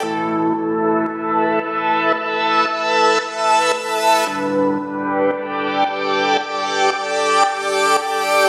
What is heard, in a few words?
113 A Synth